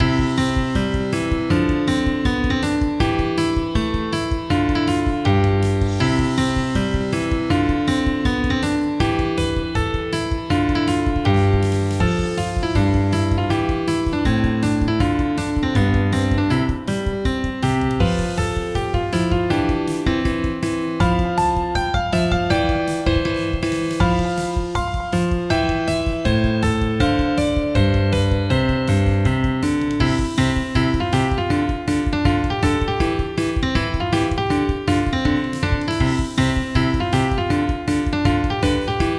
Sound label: Piano; Logic Pro Midi